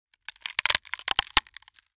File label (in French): Glace qui craque